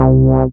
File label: SYN SH, Roland; Sound & Vision - Gigapack I CD 2